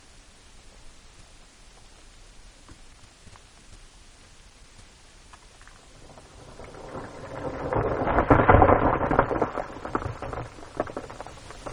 Short Midground Rock Slide; alaska acoustic highlights; GEOPHONY

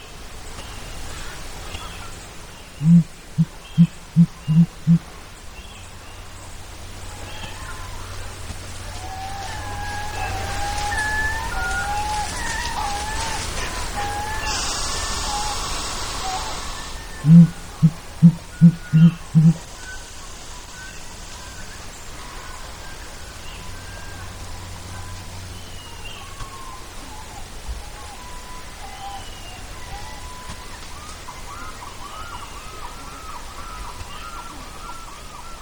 Sooty Grouse Near Microphone; alaska acoustic highlights; BIRDS

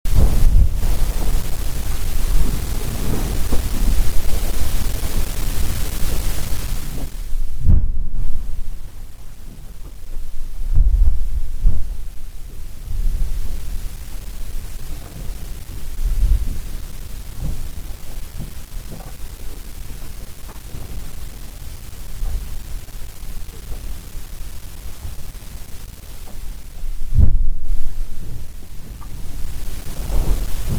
Muldrow River Surge; alaska acoustic highlights; GEOPHONY

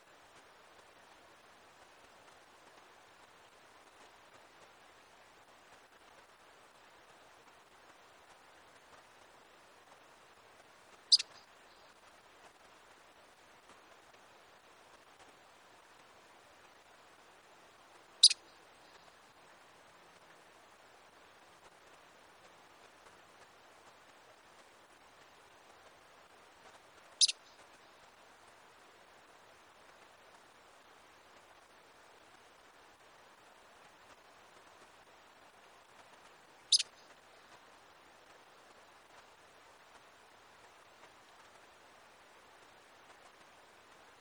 Arctic Ground Squirrel Call With Reverberation; alaska acoustic highlights; MAMMALS

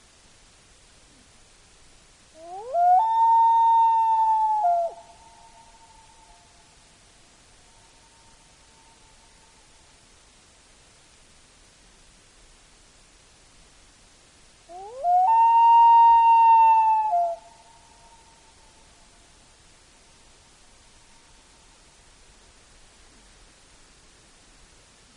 Common Loon Calls With Extensive Echo; alaska acoustic highlights; BIRDS

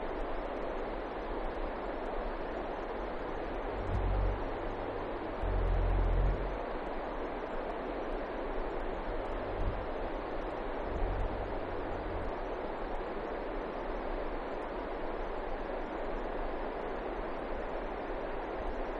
Deep Insect Wingbeats; alaska acoustic highlights; INSECTS

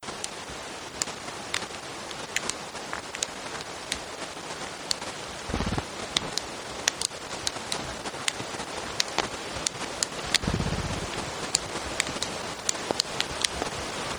Caribou Walking, Snorting, and Grazing; alaska acoustic highlights; MAMMALS

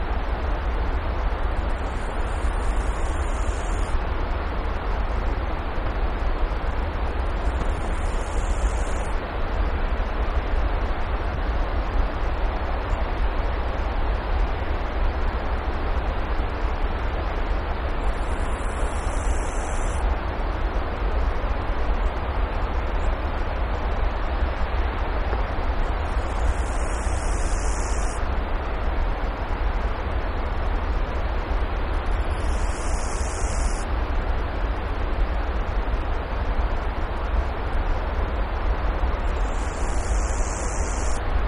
Grasshoppers Stridulating; alaska acoustic highlights; INSECTS

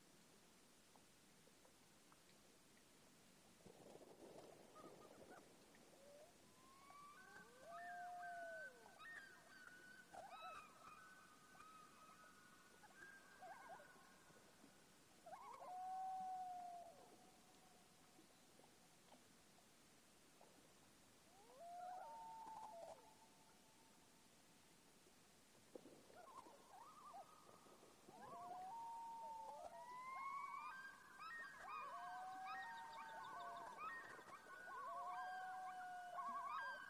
Common Loon Call Chorus; alaska acoustic highlights; BIRDS

